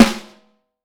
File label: HHT, vintage snare drum; Q Up Arts; Johnny.C's Vintage Snare Drum